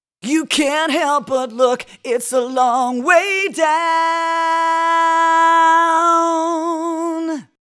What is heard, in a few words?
Portico 5043 dry; Rupert Neve Designs